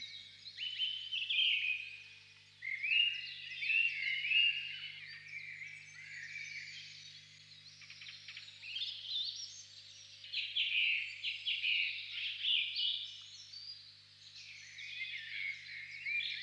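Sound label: morning in the mountains, ringing of monastery bells in the valley; utro v gorah zvon kolokolov monastirya v doline; zvuki prirodi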